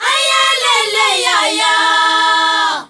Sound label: ZULU CHR, FEM. CHOIR